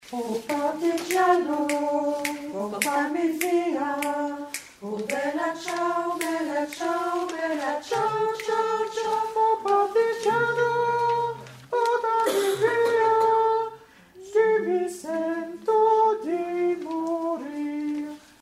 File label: alto; bella ciao; mes 9-16